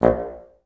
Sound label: BNS2STAC A#0, Advanced Orchestra; Best Service; Peter Siedlaczek's Advanced Orchestra